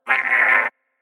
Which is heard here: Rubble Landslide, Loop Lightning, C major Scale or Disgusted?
Disgusted